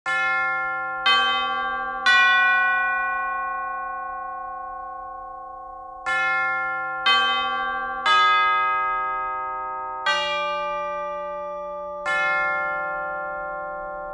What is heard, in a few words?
Church bells ringing chimes funeral; large bell